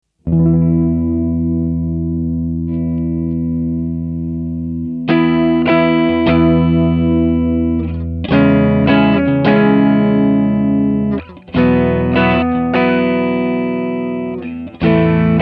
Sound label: Sapphire